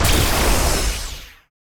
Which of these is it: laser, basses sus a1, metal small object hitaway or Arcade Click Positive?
laser